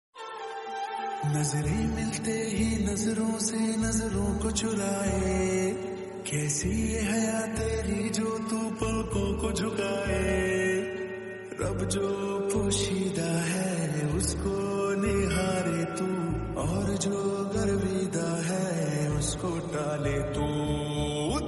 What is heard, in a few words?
slowed reverb Hindi song